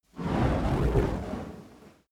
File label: fire torch whoosh long soft; swooshes-swishes